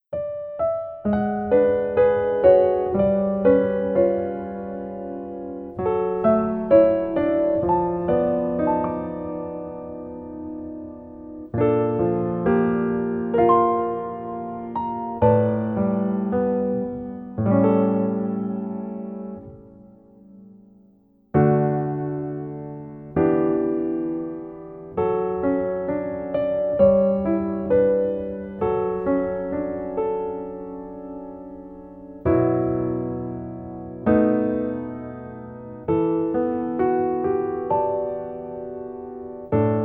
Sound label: Piano ver